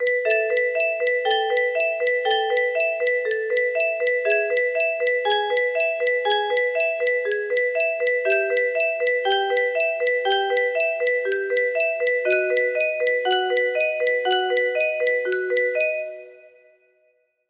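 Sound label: c scale, music box melody; voidfish duet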